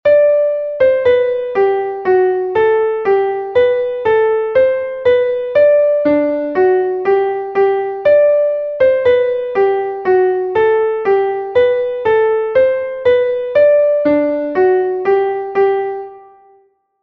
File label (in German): klavier; froh zu sein bedarf es wenig kanon; melodiemeister; Volkslied „Froh zu sein bedarf es wenig (Kanon)“